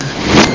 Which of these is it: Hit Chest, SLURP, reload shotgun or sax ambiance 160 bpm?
SLURP